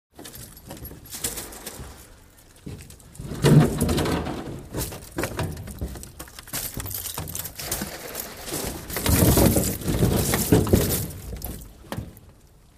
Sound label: Mechanical Hoist